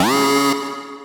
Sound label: SE ALARM; gnosia switch gamerip 2021